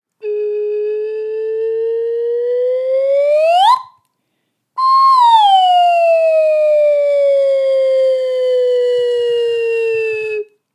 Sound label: Slide Whistle